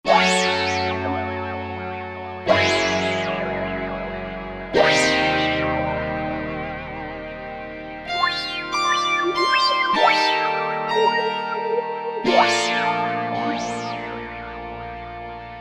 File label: ACID PANNER, Roland SC-880; Factory Patches